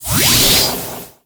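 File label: Summon Synchro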